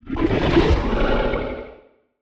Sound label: Feline Short Loud; Monster Sounds; TheDeclineOfWarriors; Monster Sounds Volume II